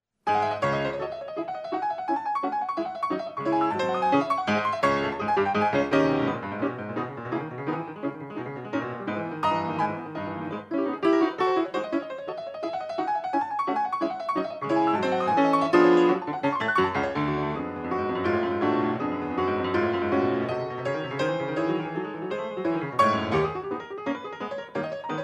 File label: etude cis moll